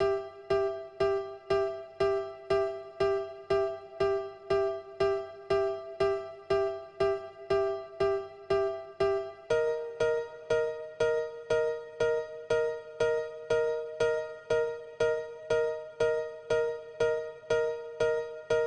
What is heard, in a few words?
Dance Piano R